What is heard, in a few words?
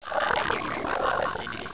alert